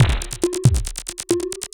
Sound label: percussion loops, 138, Techno